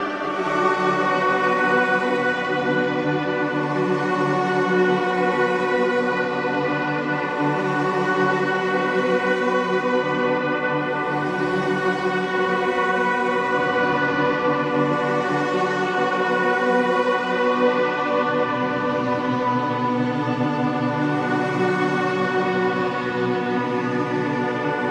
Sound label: Bm Synth Drone Move, Ambient Textures; Instrument Drones